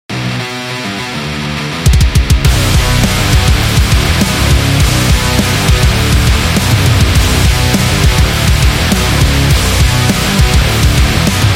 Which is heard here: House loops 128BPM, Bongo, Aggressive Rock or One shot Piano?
Aggressive Rock